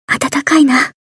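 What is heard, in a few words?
attack; hibiki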